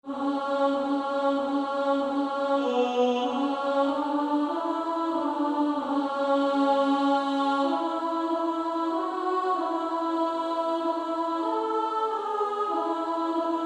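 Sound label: Female Choir, alto 1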